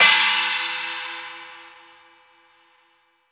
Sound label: China Cymbal; Roland BK-5